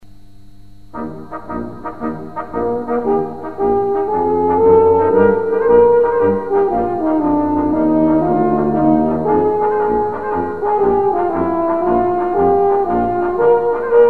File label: Radio Newsreel signature tune; BBC World Service